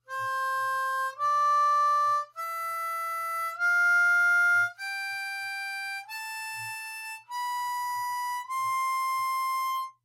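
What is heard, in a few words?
C Major Scale Individual Notes, harmonica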